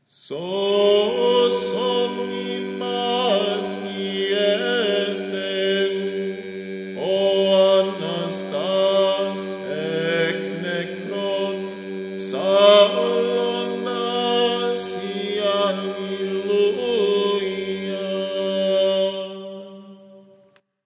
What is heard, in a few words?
Save Us Greek